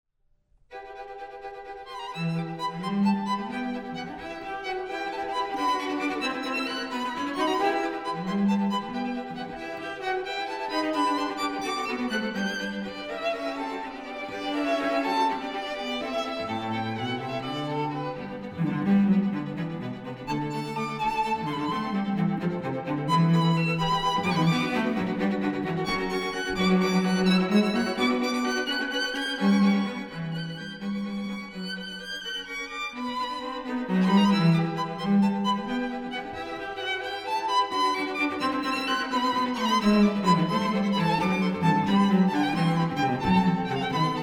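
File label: quartet; lachner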